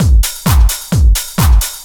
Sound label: drum 130 techno; Techno Trance Essentials